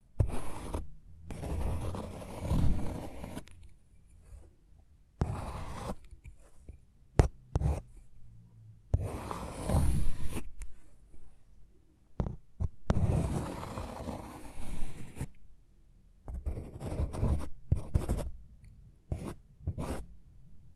Tieng but chi va but viet tren giay sot soat

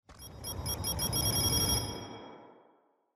hieu ung am thanh build up

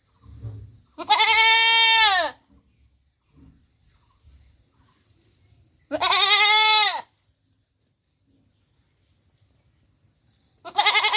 tiếng dê kêu chân thực nhất

